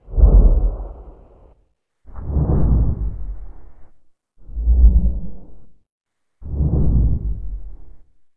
dragon wingflap distant; eqclassic

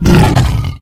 giant attack

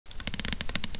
bow load

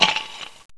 glass, debris

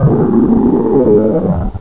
alert; coldburn

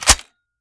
M4 Bolt Release, weapons

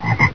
toad

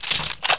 reload; ramone

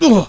player pain

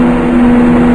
machines

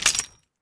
rounds, webley

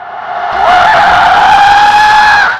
slasher ghost attack

